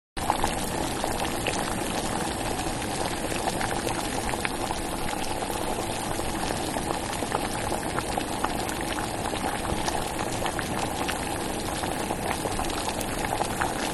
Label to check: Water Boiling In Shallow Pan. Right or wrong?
Right